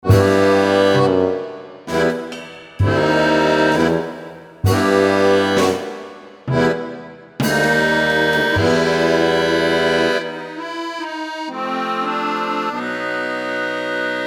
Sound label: film music animation